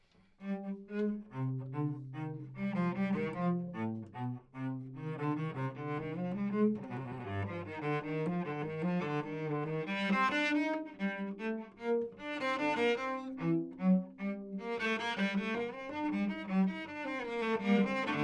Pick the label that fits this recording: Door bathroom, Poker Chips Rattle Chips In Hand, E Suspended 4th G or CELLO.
CELLO